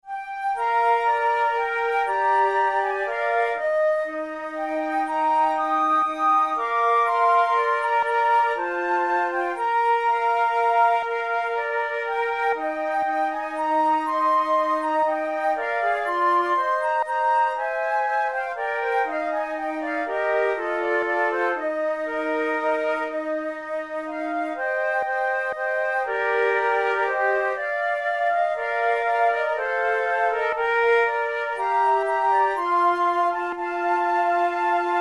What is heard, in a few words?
binchois de plus en plus se renouvelle, flute